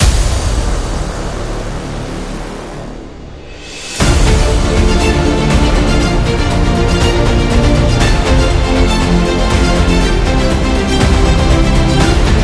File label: Epic Loop, Epic inspirational background music